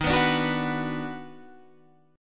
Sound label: Eb Minor Major 7th Sharp 5th Eb down Guitar Standard; strummed; chord; JGuitar